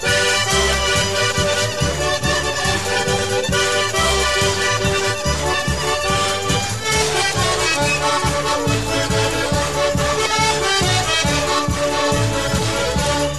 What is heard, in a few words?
polka; lietuva; ringtones lituanos